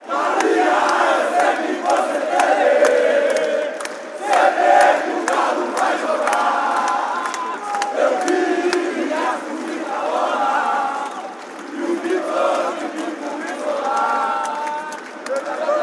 fan chants; maria eu sei que voce treme

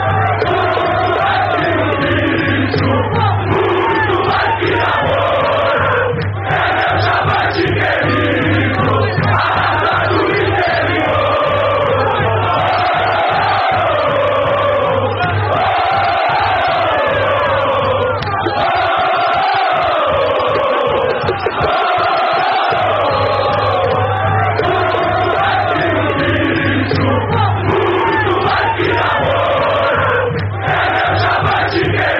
a raca do interior, fan chants

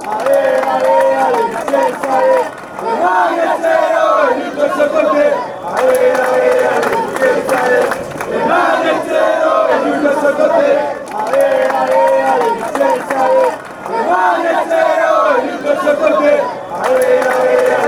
ale ale, fan chants; vicenza

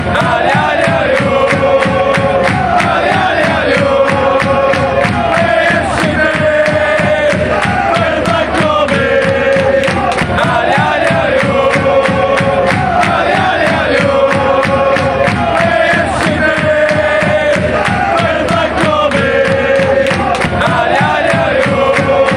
red and black army, fan chants; afcb

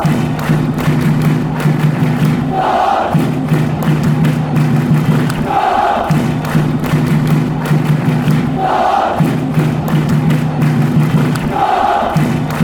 drums; carlisle

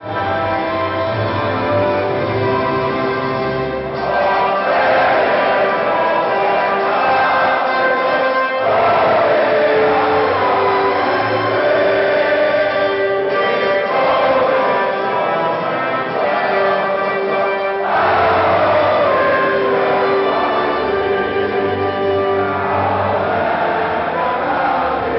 advance australia fair, fanchants

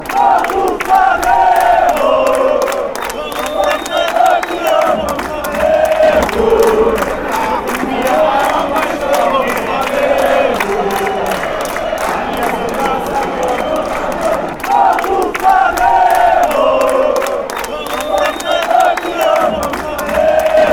chant, fan chants; fluminense

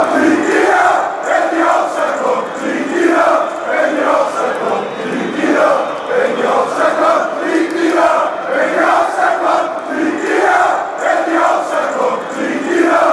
hertha chant

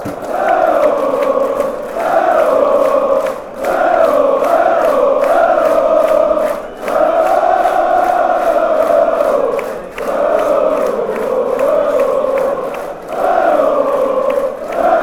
ooo, fanchants

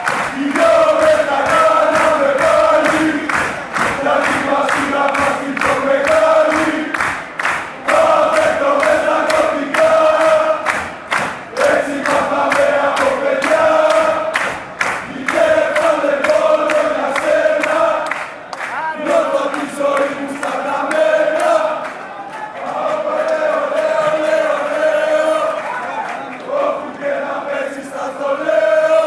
chant, fanchants; paok fc

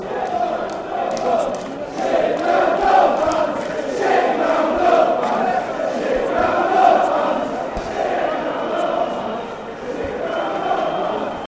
chants; sit ground no fans bcfc

